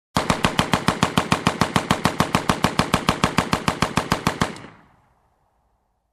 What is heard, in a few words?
Slow machine gun shots long